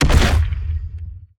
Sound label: Stealth Attack Land; Evolve; Behemoth